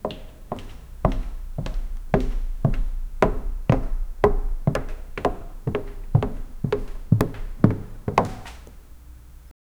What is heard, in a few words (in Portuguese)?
rangido alto de madeira, wooden creak